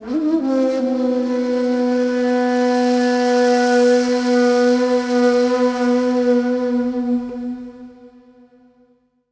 SIERRA FLU

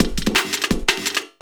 LOOP, 170 BPM, Drum & Bass

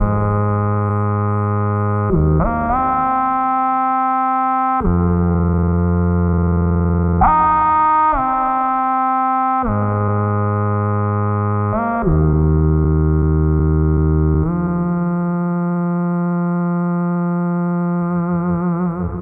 Melody, IDM

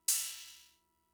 Cymbal, Fricke MFB-522, Drum Machines

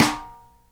snare GLASS, Live Snares

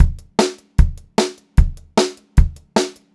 BEAT, Pop-Rock Drumloops; USB Soundscan vol.37; AKAI; 90 s Sample CDs; 152 SPEED

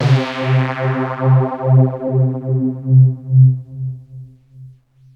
Bass Synths hits and octaves

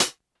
Closed Hat Edge; Lost Tapes From Mars

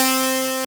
Synth, DnB One Shots

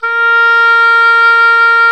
WND OBOE A#4; Roland L-CDX-03 Disk 1

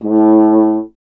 BRS F. Horn, Solo Instruments; Miroslav Vitous; Solo Instruments VOL-2; Solo lnst. II

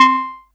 STEEL DRUM

